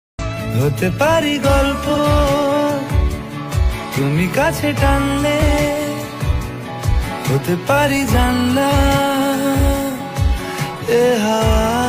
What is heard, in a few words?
bengali ringtone